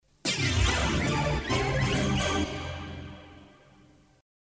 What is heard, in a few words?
Jingle